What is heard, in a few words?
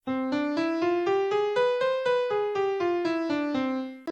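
C major scale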